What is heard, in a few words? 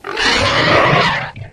flesh panic, monsters